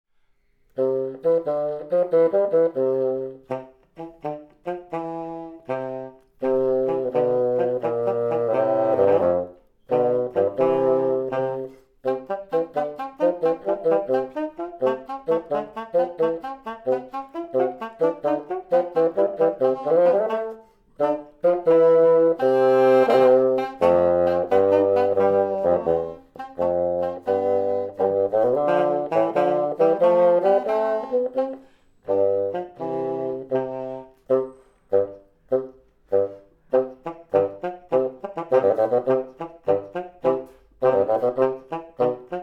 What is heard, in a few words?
two bassoons